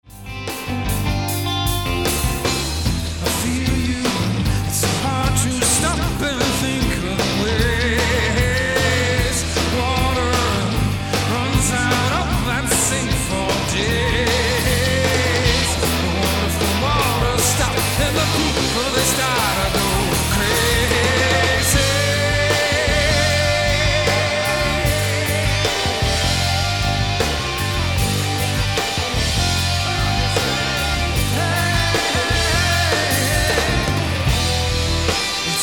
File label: American Rock Mix